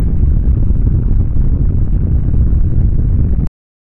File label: thunder rumble